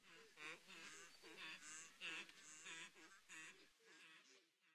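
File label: animals wildebeests; serengeti kings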